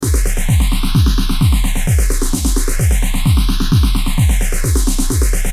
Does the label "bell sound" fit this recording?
No